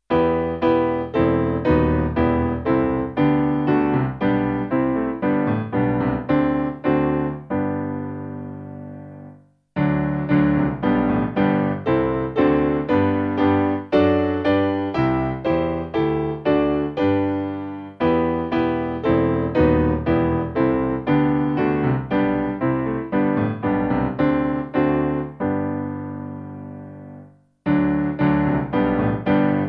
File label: Stuttgart Piano; Come Thou Long